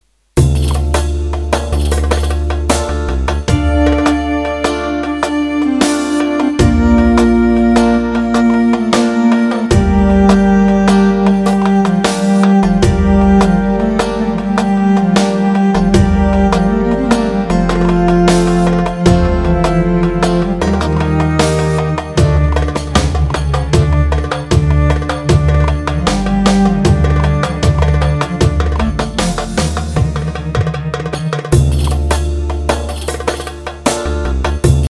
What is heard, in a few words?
duble wahde, roland gw 8 ritim